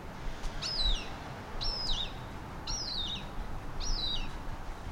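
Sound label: whistling cry of hawk flying high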